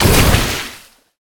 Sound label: water blast projectile spell; sonniss gdc